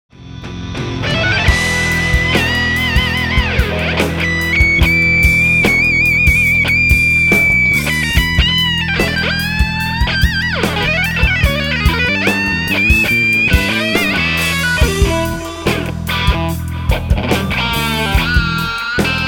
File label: solo